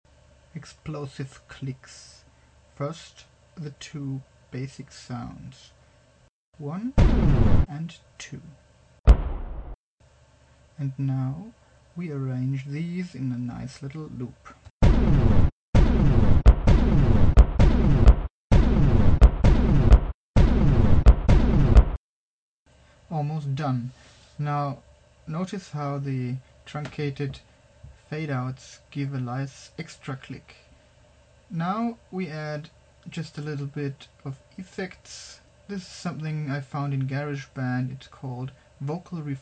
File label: explosive Clicks